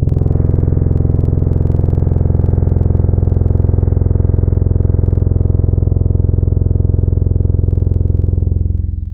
French Horn; Synthetic's Serum Collection; Echo Sound Works Core Tables; ESW Real

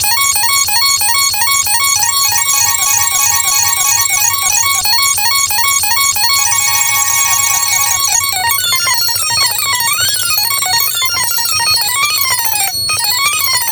shriek

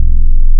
Hard 808; Synthetic's Serum Collection

